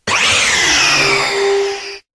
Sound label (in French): Cri Ailes de l'Aurore; USUL